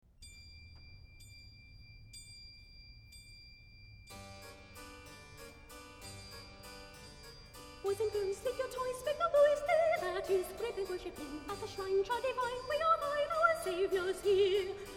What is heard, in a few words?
Zither Carol